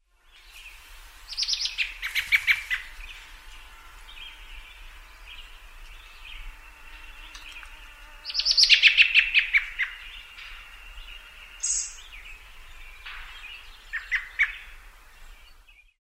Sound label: american robin